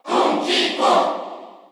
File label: Cheer Korean; Donkey Kong; SSBU